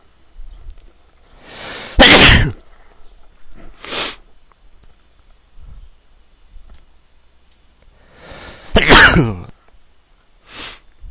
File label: allergy, male; spring 2005 allergy